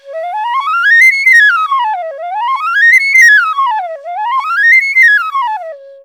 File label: INDIA PICC; Spectrasonics; Heart of Asia; IND PICC PH